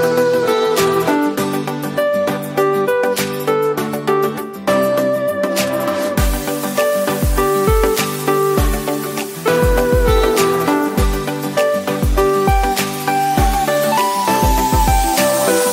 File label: Vivo Ringtone; Vivo Y36 (India)